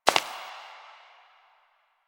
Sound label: Step Rock; Warframe; Koumei